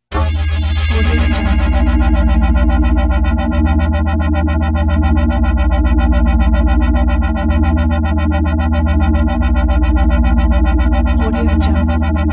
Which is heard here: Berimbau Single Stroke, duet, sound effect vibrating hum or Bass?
sound effect vibrating hum